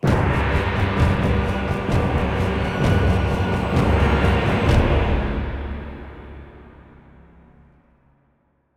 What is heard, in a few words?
Stinger; Yogg Saron Unleashed